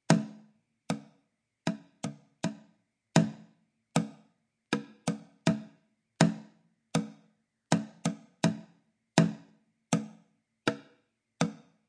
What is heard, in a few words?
Drumming